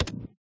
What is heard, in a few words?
Button; Voices of the Void